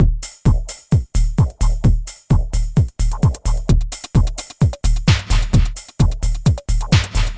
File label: Beat - Valve Limiting; VariSaturator